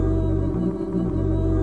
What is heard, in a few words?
ooh and bass loop; VOCAL OR VOXY LOOPS